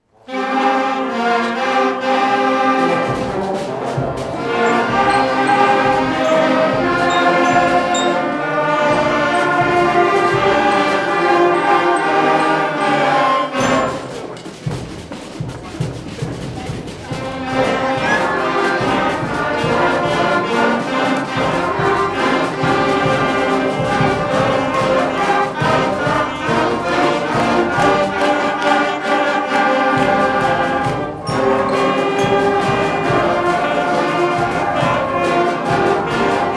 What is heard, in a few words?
Tambora, Band Concert; Torrington Public Schools District Band Concert 2014